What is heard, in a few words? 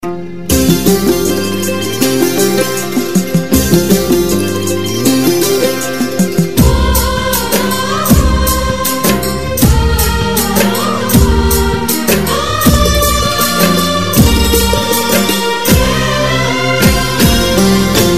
Vivah Music